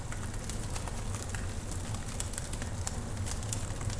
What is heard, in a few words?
Burn Crackling; hidden deep windows gamerip 2022